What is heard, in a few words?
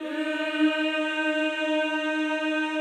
CHO Yhh, Roland; Sound & Vision - Gigapack I CD 2 (Roland)